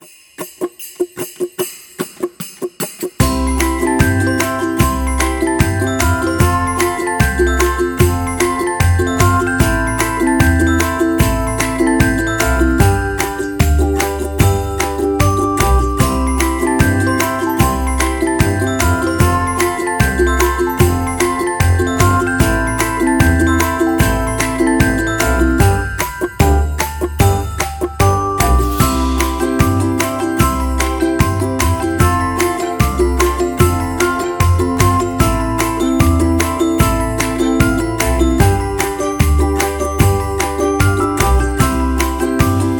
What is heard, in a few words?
Festive Ukulele; background music